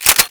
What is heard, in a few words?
skr cock, skorpion; weapons; garrysmod